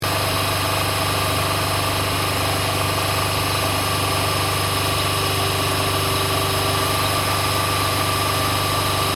before 3k, cat-back; sti

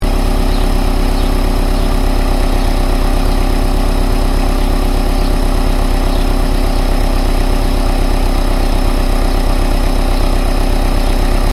before idle, cat-back; sti

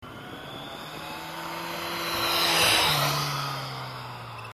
oem pass, cans

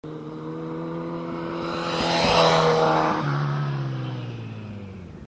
pass, cans

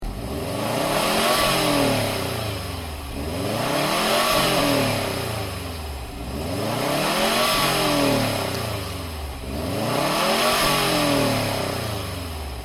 before rev, cat-back; sti

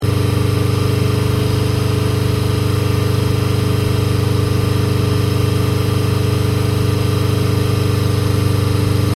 after 3k, cat-back; sti

